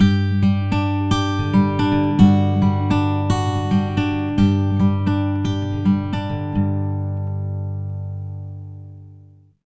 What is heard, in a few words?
110 MAJ PICK G, Acoustic Guitar Loops; USB Soundscan vol.04 - Electric & Acoustic Guitar Loops; AKAI